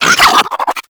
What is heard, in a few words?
Infested Biped Flip; Warframe